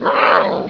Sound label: pain, monsters, ogre; svencoop; quake1